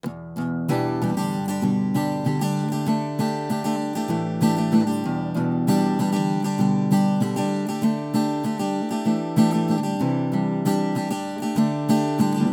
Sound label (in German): a-gitarre neve 1073 lo-z pre 60db